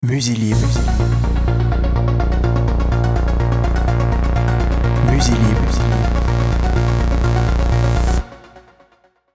science fiction jingle

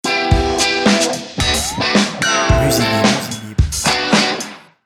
funky fresh jingle

